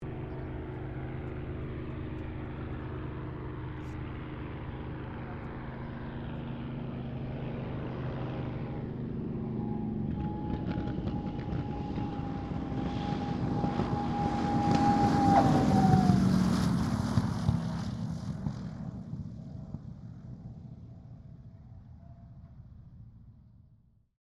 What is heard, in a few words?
AIRCRAFT CA-18 MUSTANG LAND; P51 /CAC-18 Mustang; Airplanes; Vehicles